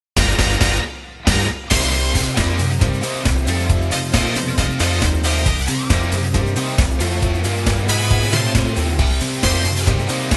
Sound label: Instrumental